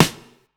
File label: Snare, Drum Hits; breakcore; breaks